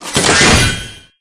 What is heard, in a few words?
Chest Make Gem